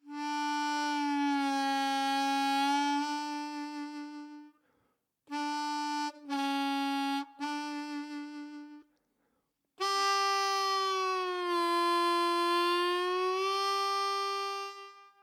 All Bends As Slides And As Semitone Intervals, Blues Harp